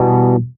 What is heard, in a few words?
stab smear, stabs